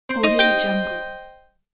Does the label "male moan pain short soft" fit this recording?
No